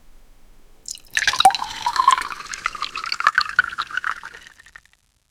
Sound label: the splash, drinks